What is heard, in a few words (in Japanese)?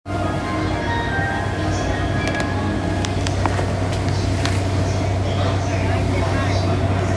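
山手線 神田 clockwise, train sounds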